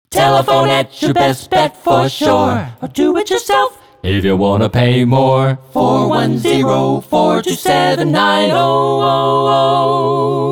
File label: JINGLE